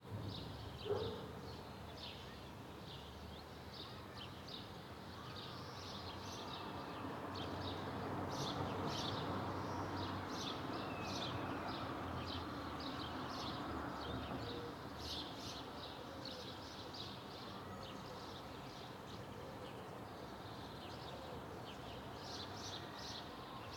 RESIDENTIAL Afternoon, Birds, Distant Traffic, Distant Voices, Dog Barking, Car Horns; Residential Ambiences